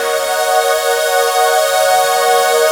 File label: Pad, Jungle; Blu Mar Ten; Jungle Jungle - 1989 to 1999 Samplepack